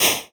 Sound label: whoosh fast